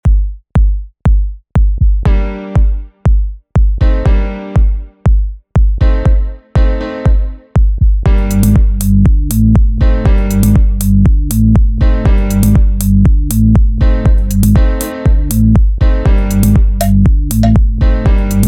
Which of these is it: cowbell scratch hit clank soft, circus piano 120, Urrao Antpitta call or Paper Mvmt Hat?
circus piano 120